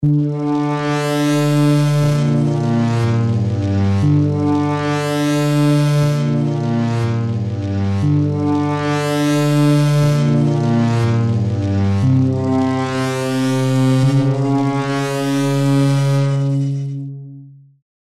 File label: Bass PAD Macro Modulation; Heavyocity Mosaic; Prowess